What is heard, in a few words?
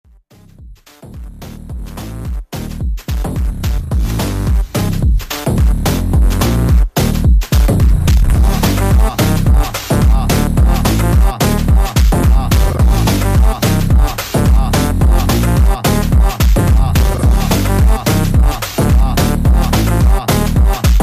slowed